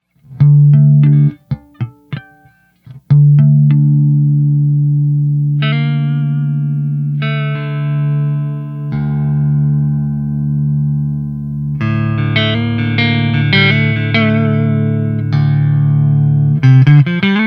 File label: blues; Edwards